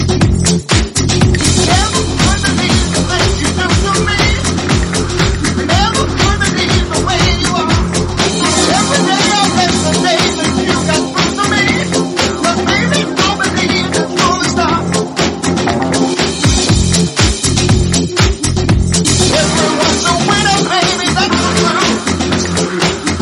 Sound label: Funky